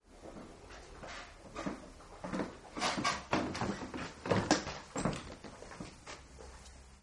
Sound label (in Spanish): pasos